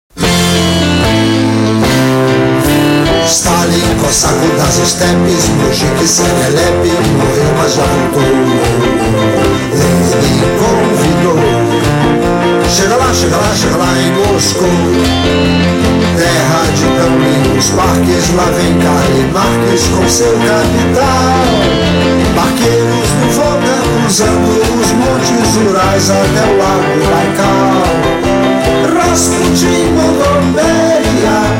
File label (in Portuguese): marcha russa